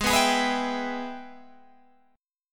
Minor Major 7th Sharp 5th, Guitar, Open G; Gsharp; 18,16,18,16,17,17

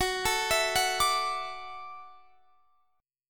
F sharp Minor 7th Sharp 5th F sharp

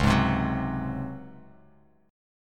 Db Major 7th Suspended 4th; 9,11,10,11; strummed; chord shape

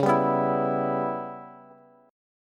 Db Minor 7th Flat 5th Db down Bass; strummed

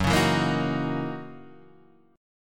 Gb 13th Gb down Guitar Half Step Down

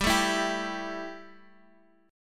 E Minor Double Flat 5th G, guitar

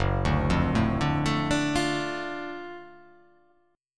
F sharp Minor Major 7th Sharp 5th

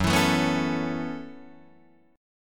Gb Minor 11th Gb Guitar DADGAD

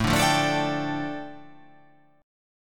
A 7th Suspended 4th Sharp 5th, A, down

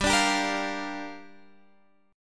G Minor 7th, Guitar, Standard; Gm7 strummed; JGuitar

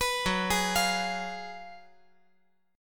Gb Major 7th Suspended 2nd Suspended 4th, down

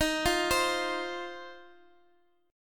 C Minor Double Flat 5th D sharp

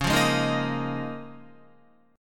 C sharp 6th Add 9th C sharp down Guitar; strummed

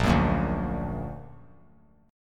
A sharp Augmented; A#+ strummed; JGuitar

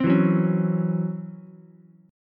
C sharp Minor Major 7th Double Flat 5th Ukulele; C#mM7bb5; strummed; JGuitar

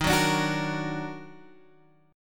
Eb Minor Major 7th Eb down Guitar Standard, chord; EbmM7; strummed; JGuitar

